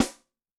Picket, Yamaha DTXpress-II snare

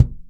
DEEP KICK, ACOUST KICKS; Greytsounds

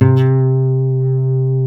C NYLON, Guitars

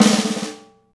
Folk Snare Tango; Snares

